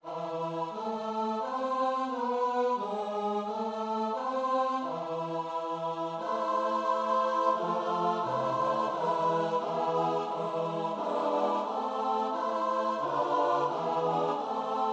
bethlehems manger, choir

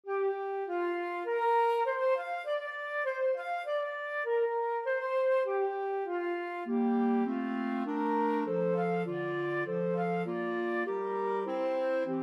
trio, flute clarinet bass clarinet

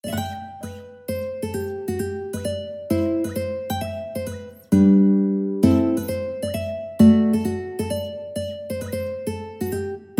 Polska, mandolin

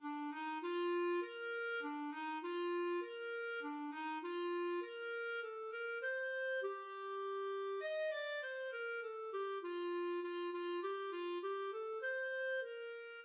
dona blanca, clarinet

